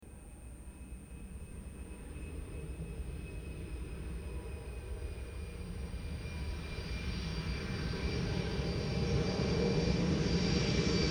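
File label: Landing DC-10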